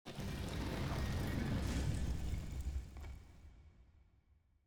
ambience room necromastery rock

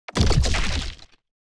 successful build

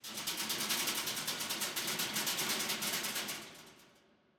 ambience room torture cage

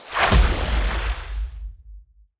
Box Crystal Explosion Small; TANK; 3Souls

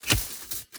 environment zone metal thread break

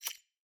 ui submenu notifications close

